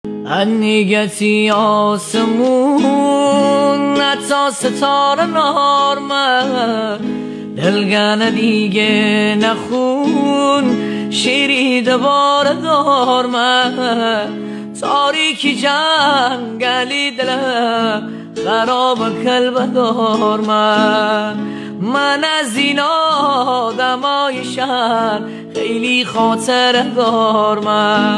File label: Guitar